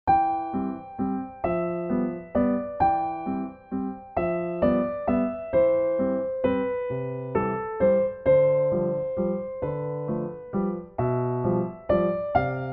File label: Waltz Op. 36 No. 3; Amy Beach